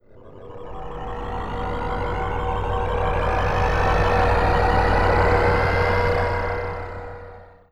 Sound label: Lunar Atmo; Dance; SFX